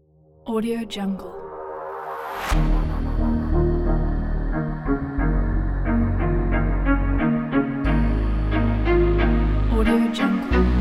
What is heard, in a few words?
epic sport trailer; joysound